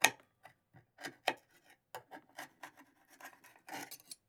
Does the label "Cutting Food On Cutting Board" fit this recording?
Yes